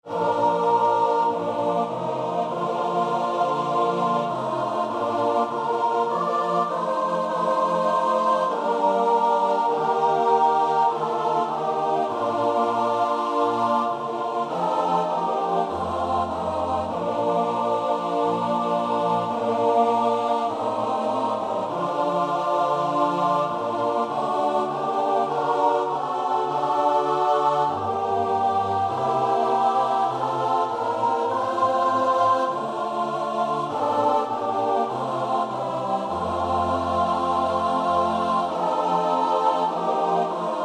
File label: choir